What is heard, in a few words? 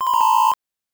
Coin